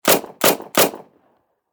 Warfare Submachine Gun Thompson 9Mm Triple Shot Distant Perspective; Machine Gun Sound Effects